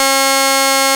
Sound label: HARPSICHORD; WS#3 BAROQUE; AKAI S-Series CD-ROM Sound Library VOL-2